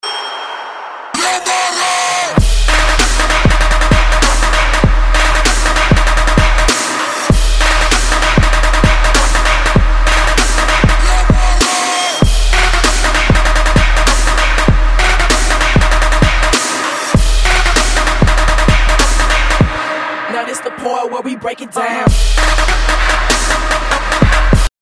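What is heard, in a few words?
remix harsh ringtone; zhestkiy zvonok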